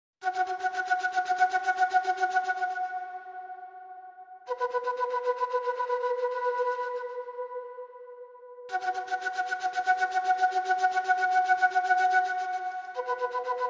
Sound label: Flutes Only